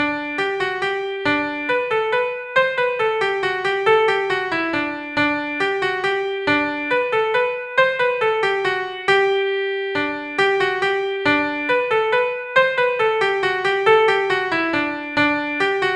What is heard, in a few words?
mazurka; auvergne